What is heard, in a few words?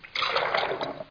pour; TomCat